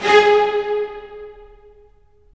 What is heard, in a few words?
STR, STR Full Short; Roland - LA Composer vol. 4